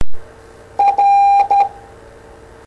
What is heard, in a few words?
Morse R